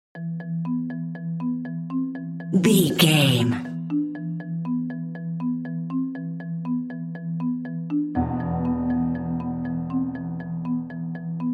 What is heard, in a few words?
mysterious serious mallets and piano